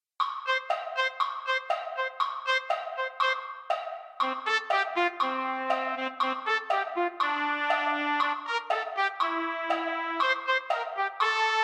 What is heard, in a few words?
trumpet; holiday brass